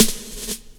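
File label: snare drum hip hop, drum kit; DRMKIT; E-Lab; X Static Goldmine 2; akai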